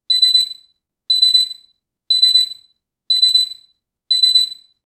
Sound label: countdown beeping rocket